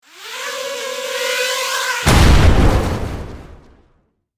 Military drone flight and explosion; style 1 short; misc explosions; gfxsounds

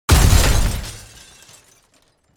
Car crash impact

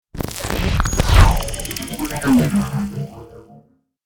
Futuristic trailer transition

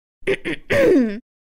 Teenage girl clearing throat

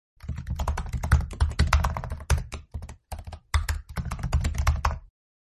MacBook keyboard typing

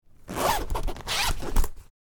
Makeup bag zipper open or close

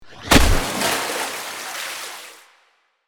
Water magic spell large impact

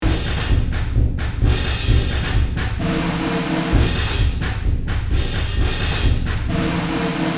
Field drum marching loop

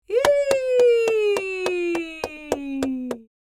Mature female applause and cheers

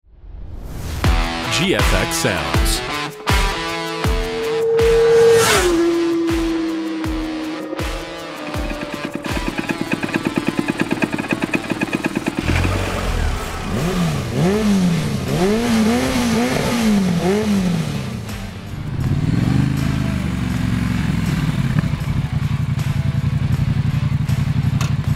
road bikes sfx pack

